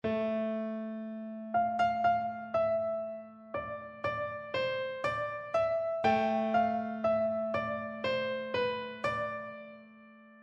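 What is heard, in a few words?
electric piano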